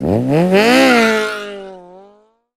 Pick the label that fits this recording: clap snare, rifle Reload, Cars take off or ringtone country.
Cars take off